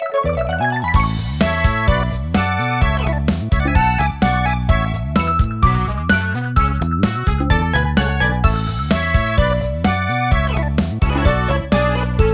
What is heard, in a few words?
Mission Clear; Pokémon Mystery Dungeon Gates to Infinity; 3DS